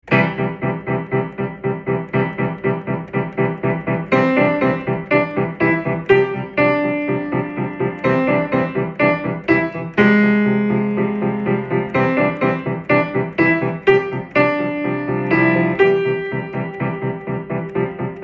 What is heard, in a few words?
piano piano; Toureillo